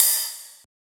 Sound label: Ride; FLPRO